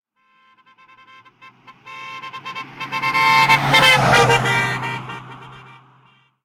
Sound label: car horn; Blind Drive